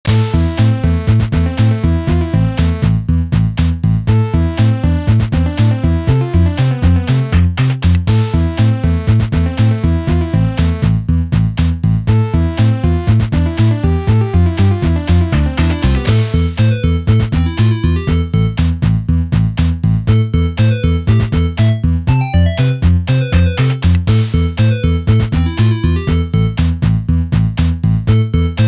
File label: Music Random